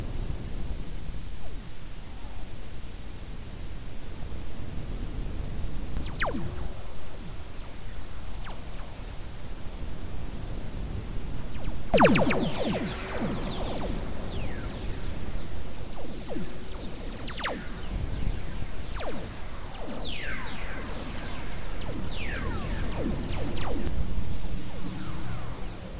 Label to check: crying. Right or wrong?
Wrong